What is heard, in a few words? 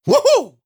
effort grunt male